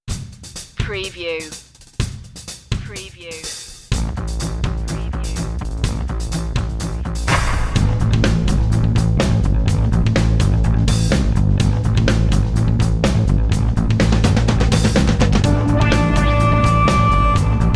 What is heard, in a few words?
slow burn southern